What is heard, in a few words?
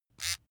razor small short